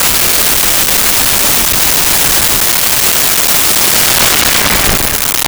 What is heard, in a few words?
Large Cal Auto Sweep, Weapons